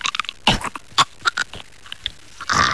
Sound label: death; firearms